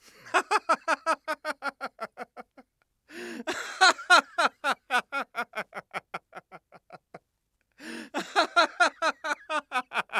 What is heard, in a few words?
joyful